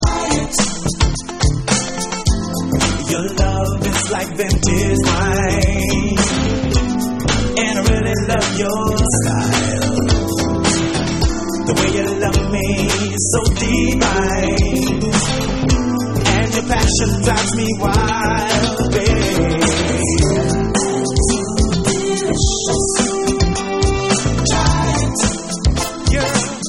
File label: Vocal